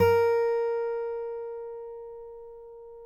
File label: A#4, FNGSTYLE, Guitars; Spectrasonics; Hans Zimmer Guitars